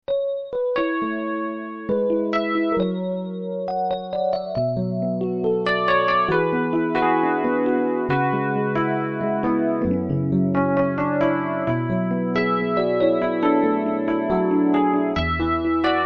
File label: Epiano